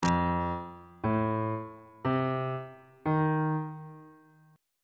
b flat ionian 7th chord 5 is f dominant 7th on bass clef